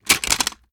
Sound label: mg3 cover open; tacz; daffas arsenal